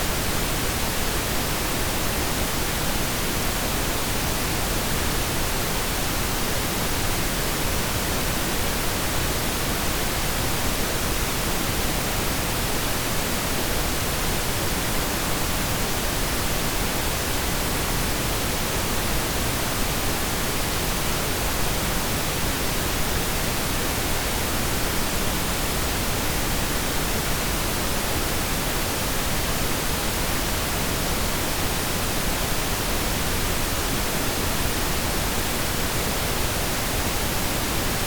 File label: pink noise